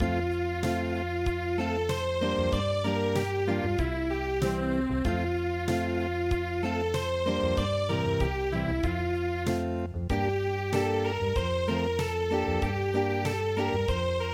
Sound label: Unplugged; EARLY ONE MORNING